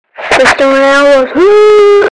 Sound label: "suuui"